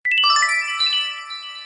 nokia 5800 sms; ringtones

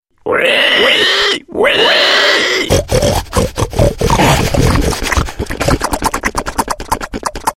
crazy pig

